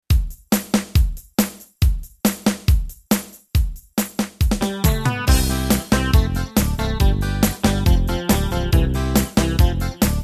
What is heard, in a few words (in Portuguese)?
ritmos yamaha jovem guarda